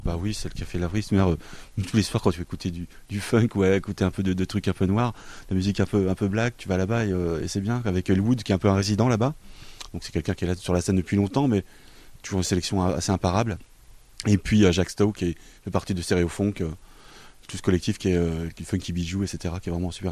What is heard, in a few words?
funk; barsnrennes2011